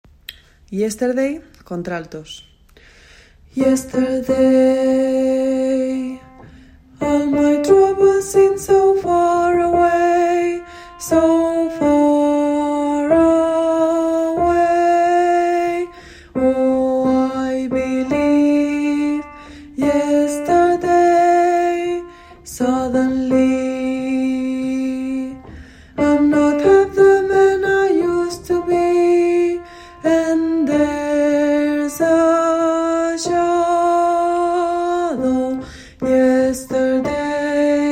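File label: alto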